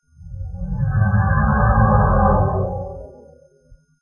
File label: cloak device; romulan